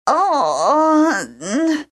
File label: DYING; BattleSNDS